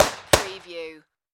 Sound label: Submachine Gun Sterling